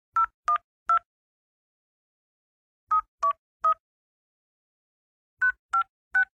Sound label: keypad